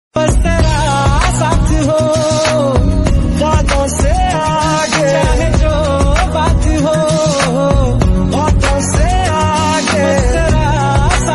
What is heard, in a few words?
Hindi Ringtone